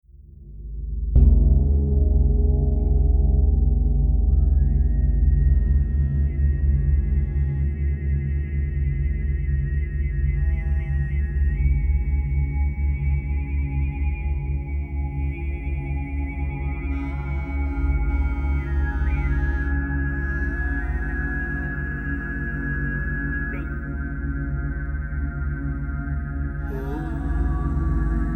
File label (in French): extrait chant tibetain de l himalaya